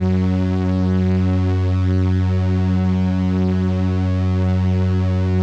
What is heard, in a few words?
505, String Machines